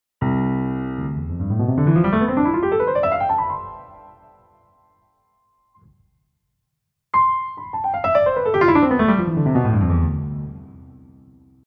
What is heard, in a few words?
scale